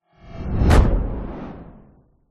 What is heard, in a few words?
whoosh dark glitch into impact hard; sound design; zapsplat